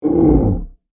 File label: Monster Groan, Horror, Monster, Groan, Creature